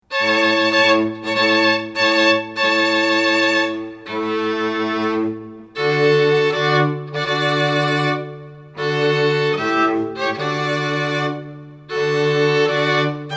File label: Bridal Chorus